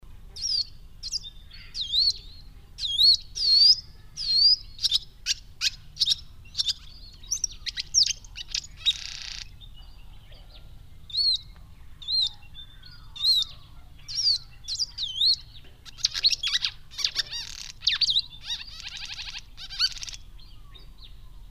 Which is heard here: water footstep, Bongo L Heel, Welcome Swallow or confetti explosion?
Welcome Swallow